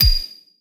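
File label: Design misc Kill Confirm Metallic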